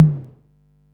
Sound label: TOM LOW, drum kit; Polyend; Stazma & Concrete Collage; Concrete-55